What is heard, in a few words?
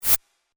noise